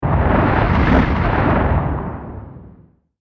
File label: Explosion Shockwave Pass By Fast; SFX Bible 6